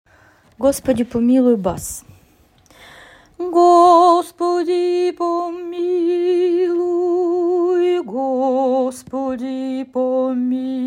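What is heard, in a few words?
gospodi pomiloui bass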